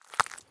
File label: jump glass; Escape from Tarkov